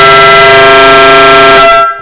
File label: dual, horns